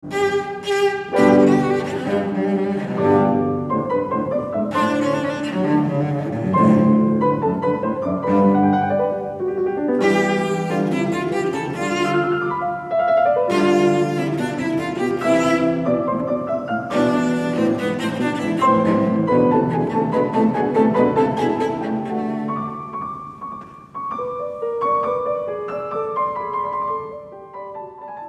Cello Sonata in G Minor Op. 5 No. 2 II. Rondo. Allegro; Beethoven